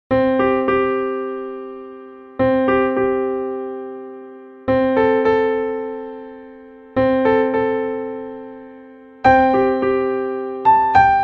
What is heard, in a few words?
silent, soft piano music